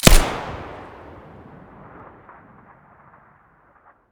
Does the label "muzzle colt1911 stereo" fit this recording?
Yes